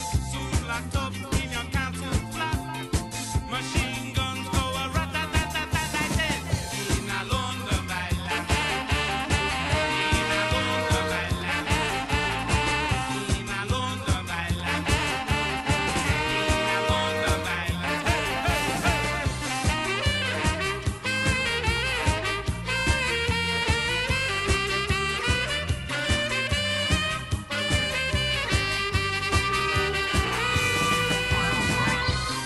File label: sax violine; inner london